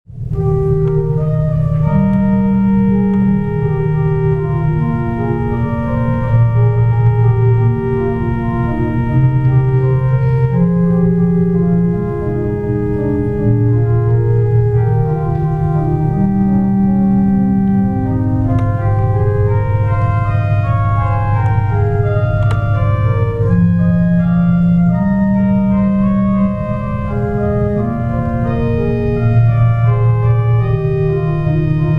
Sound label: organs; First Ave Pres; AGO; Bach